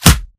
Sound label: bullet light ballistic flesh; Titanfall 2